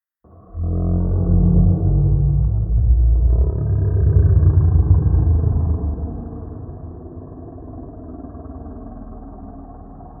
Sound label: horror sound lurking horror monster